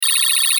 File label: Data Readout Digital; Flash, Animation, Digital, Sweeper, Transmission, Short, Production Element, Imaging Element, Accent, Transition; Multimedia; Blastwave